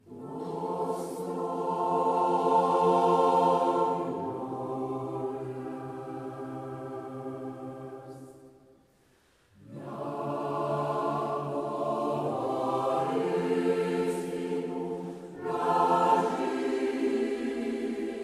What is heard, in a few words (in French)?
Chorale Superlux S502; Couple ORTF; microphone